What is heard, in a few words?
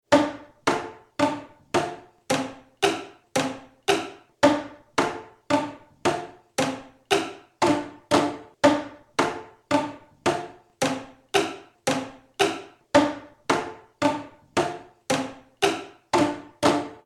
boomwhackers